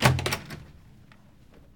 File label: door open; Golden Crayon Sounds